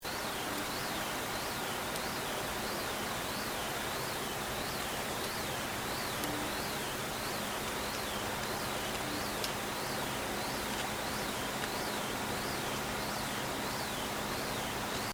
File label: Acoustic; climatide; noaa